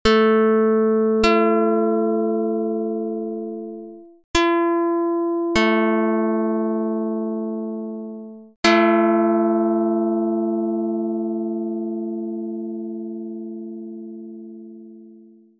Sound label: pluck; dr220